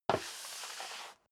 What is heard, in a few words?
step sneaker metal dry slide long; schritte